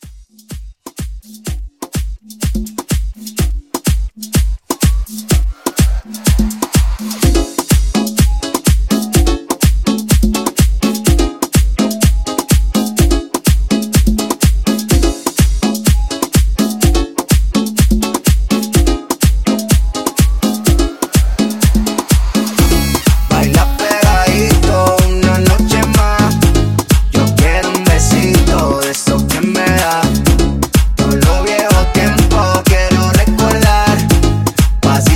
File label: Latin Club